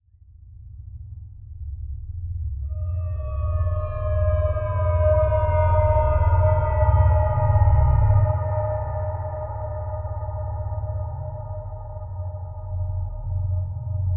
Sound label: Rain for Deep Sleep